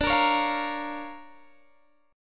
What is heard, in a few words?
D Minor Major 7th Sharp 5th, D